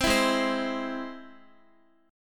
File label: Ab Augmented Ab, Guitar, 4E, 4A, 5D, 5G, 5C, 6F; Ab+ strummed; JGuitar